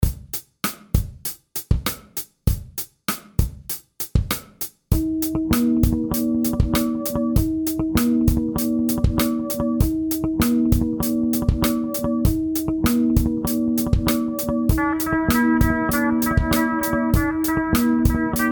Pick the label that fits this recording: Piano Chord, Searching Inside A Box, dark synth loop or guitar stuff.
guitar stuff